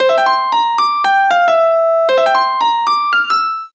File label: Windows Default; Samsung SGH-i600